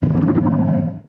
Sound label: stomper idle big, enemies; Factorio